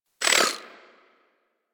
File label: Pistol Proj By; Warframe; Tenno; Guandao